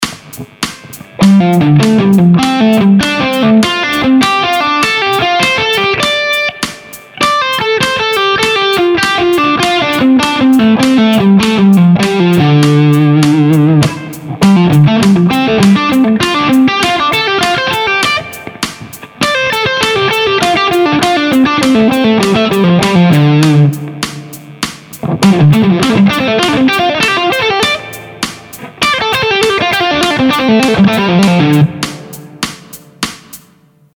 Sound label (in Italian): Permutazione 321 Pentatonica Minore